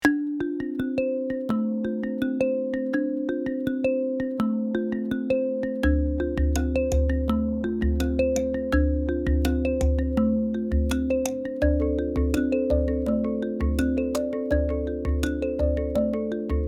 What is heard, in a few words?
Travel adventure wanderlust rhythms